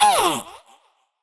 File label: Girl Pain A; Warframe; Zariman; Skitter Girl